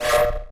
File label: teleport; lines